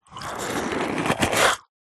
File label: cafetiere plunger lift dry; household; zapsplat